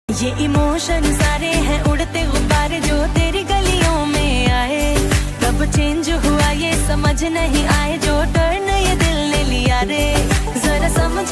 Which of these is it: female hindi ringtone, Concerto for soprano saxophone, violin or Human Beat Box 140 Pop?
female hindi ringtone